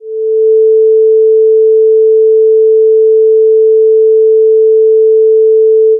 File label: sine 440Hz